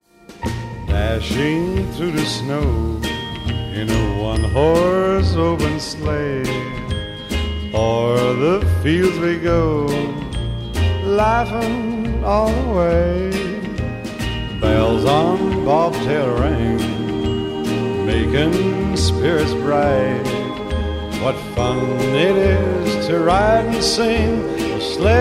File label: Jingle Bells slow